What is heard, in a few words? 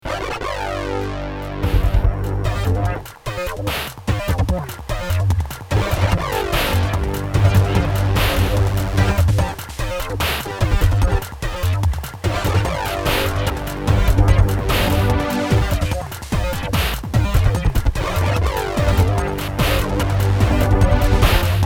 JX8P song; roland